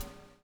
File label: BUBL; Spectrasonics - Backbeat